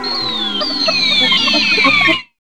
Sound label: SYN FX